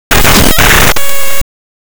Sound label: glitch